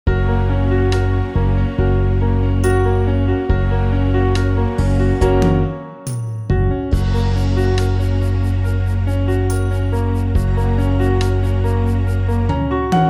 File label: piano ballade